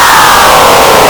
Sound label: Political Noise; lolicore